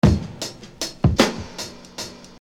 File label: Beat, Hip-Hop Street Beats